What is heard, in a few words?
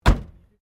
CAR MAZDA RX7 SERIES3 EXTERIOR DOOR CLOSE